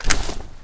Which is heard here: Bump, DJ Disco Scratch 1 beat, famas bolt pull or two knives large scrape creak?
famas bolt pull